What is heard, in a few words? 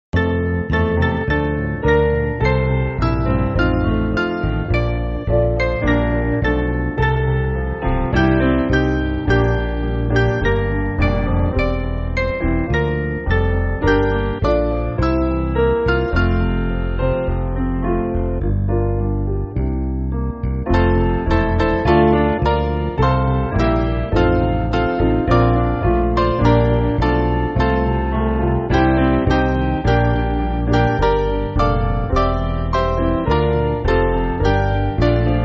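Piano, Free Music Backing Files for Churches; O for a thousand tongues to sing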